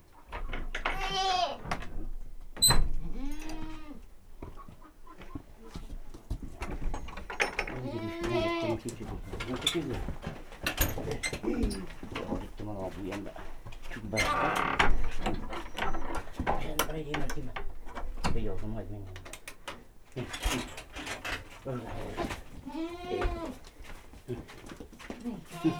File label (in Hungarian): kecske ólban a gazda; parasztudvar; hazikecske